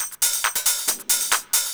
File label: Groove 137; Dance Mega Add On Grooves